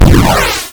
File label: 8-Bit Games Explosion; ShapingWaves